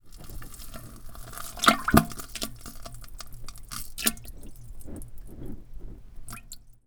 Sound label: shitting; Farts